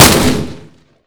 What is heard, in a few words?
shotgun attach; weapons; ModernWarfare